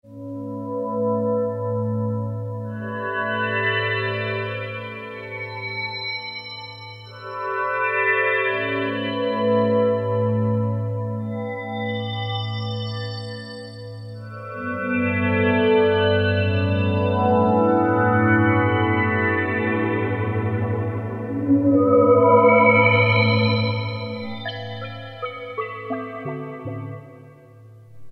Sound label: Kurzweil K2000 Glassy Eyes; Kurzweil K2000 V3; Factory Programs